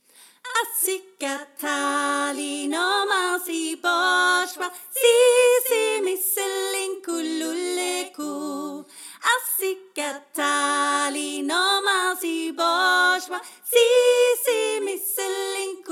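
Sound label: alt, seniorkor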